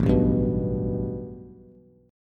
A 7th Suspended 2nd Bass; A7sus2 strummed

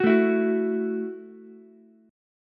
Gb Minor 7th Gb down Ukulele; Gbm7 strummed; JGuitar

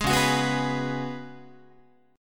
C sharp 7th Suspended 4th C sharp, Guitar; C#7sus4; strummed; tuning=4A,4E,5A,5D,5G#,6C#; guitar chord

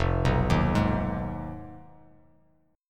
F Minor 7th Flat 5th F down; Fm7b5 arpeggiated; JGuitar

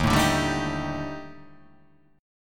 D sharp Major 7th Flat 5th

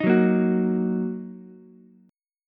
Bb Suspended 4th Sharp 5th Bb down Ukulele; Bbsus4#5 strummed

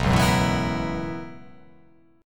B Major 7th Suspended 2nd Suspended 4th B

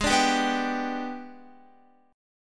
Ab Minor Major 7th Flat 5th, down, Guitar

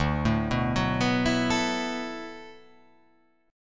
D 6th Add 9th D

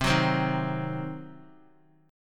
C Diminished, Guitar, chord; strummed; JGuitar

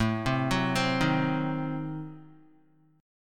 A Diminished 7th A down Guitar; Adim7 arpeggiated; JGuitar

